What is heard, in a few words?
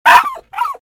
damage, fox